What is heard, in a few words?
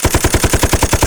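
Machine Gun Fire; gamerip; Mars Sortie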